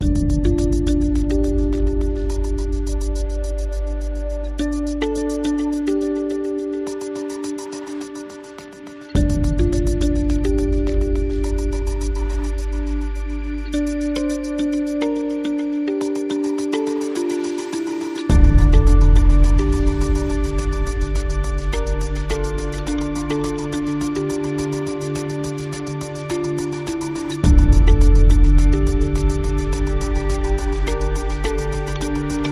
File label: No Choir